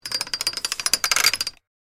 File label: Safe vault dial lock turning click